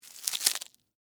Sticky Fast Rip, Texture, Sticky, Fast, Rip, Tear, Rubber, Gloves, Movement; Cleaning